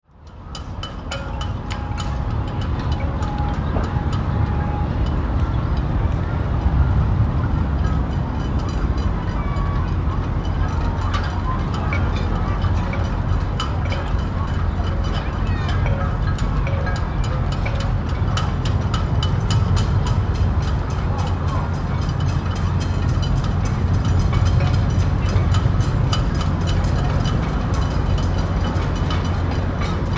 Harbour Atmosphere, Rigging - gulls - background boats passing - water; Harbor Atmosphere 1 - Rigging - gulls - background boats passing - water activity; Outdoors